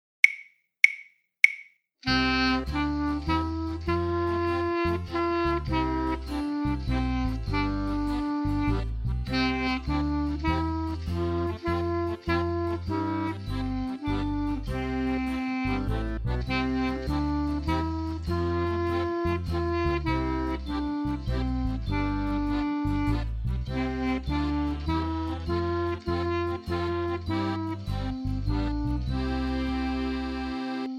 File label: country waltz